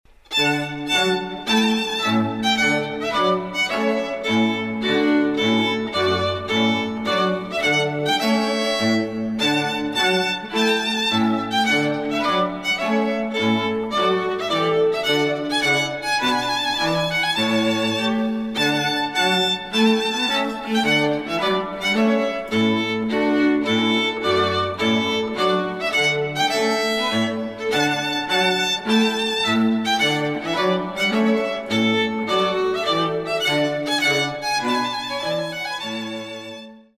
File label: Trumpet Tune